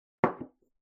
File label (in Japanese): グラスを置く音